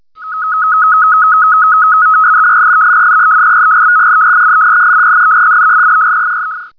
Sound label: ASCII-110, Shortwave Digital Modes; Digimodes